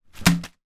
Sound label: foley paper scrunched up throw hit table